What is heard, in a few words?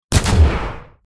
plasma blast